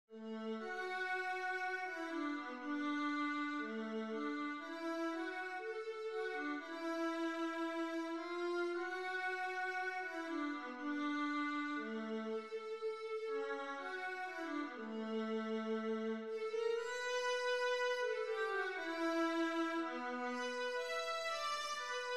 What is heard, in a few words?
concerto b minor viola